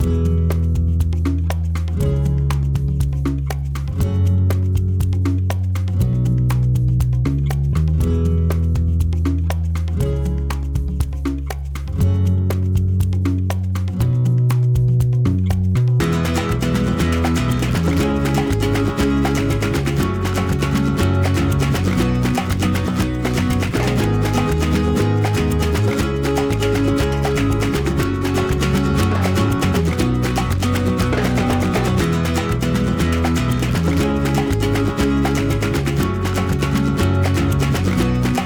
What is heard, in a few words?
Ask Countdown Music; Jackbox Games; The Jackbox Party Pack 1; YDKJ